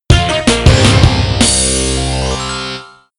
Fanfare; lego racers 2

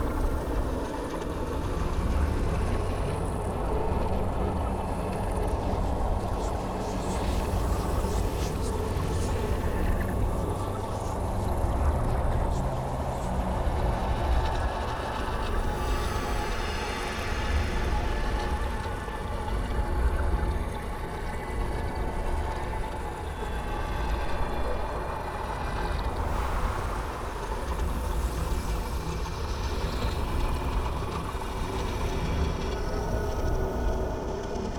Ambient

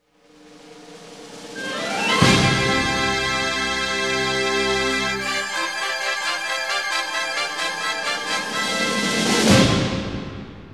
Victorious Funk Championship Jingle; tokimeki memorial taisen tokkaedama poitter s point

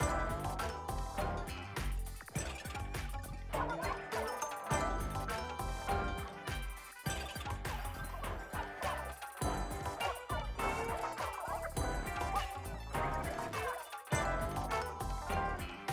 BGM Plaza Gear Shop

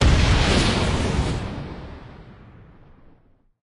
boom; endless world idle rpg gamerip 2019

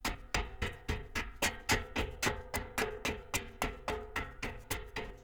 Ladder Climb Up; Among Us

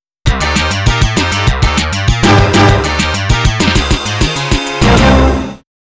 STAGE CLEAR; snk arcade sound digital collection vol. 01

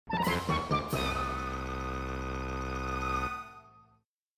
Ally in Distress!; Pikmin 3

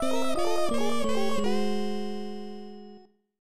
Lost Race; stunt race fx

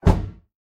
Short Hit

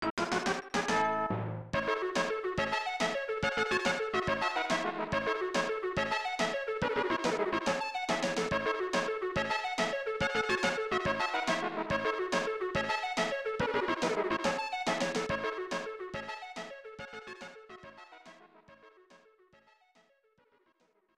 Battle Victory!; ranma 1 2 akaneko dan teki hihou 1993 snes

